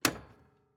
Switch, Electrical Panel Breaker, On; UberDuo; The Cabin Audio Playset

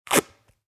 tape rip; Sound Design Tools; Raw Foley

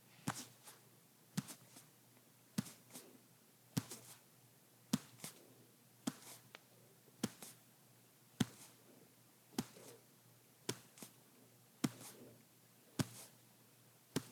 Stomach skin Fingers Pat Soft, Hand Body Foley; Joshua Reinhardt; Ultimate Hand Body

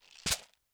coffee, beans, canister, flip, hit, single; Eiravaein Works; WakeyWakey

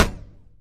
impact Plate medium; Kenney's Sound Pack; Impact Sounds

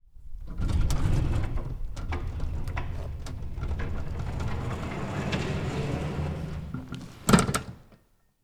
Shutter Door; 99Sounds; The Warehouse

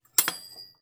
Silverware, Cooking night; Nikko Barrera-Amaya

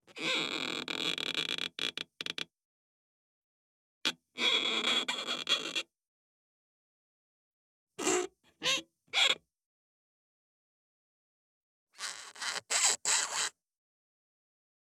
SQUEAKS AND CREAKS Balloon Squeaks Medium; Squeaks and Creaks v2

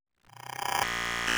Tech, Weird Side Samples

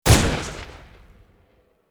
Bullet Time; FXHome; Bullet and Gun

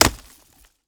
Log Hit and break; Wood Impacts; Red Libraries

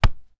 Keeper Punch, Soccer; Stuart Duffield; Soccer SFX

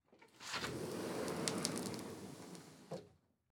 Door, Sliding Glass, Open; UberDuo; The Cabin Audio Playset

